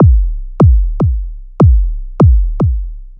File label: Machine Kick; Sonic Foundry (Sony Creative Software); Electronic Point Blank